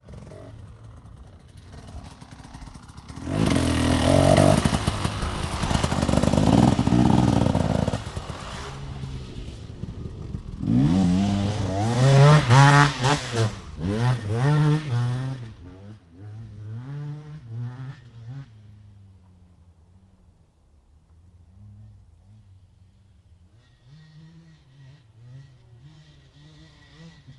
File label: bike freestyle revving stunts driving ktm 250 enduro motorbike; Sonniss GDC